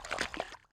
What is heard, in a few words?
mud, footsteps walk; boomer